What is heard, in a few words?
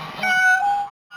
peacock sound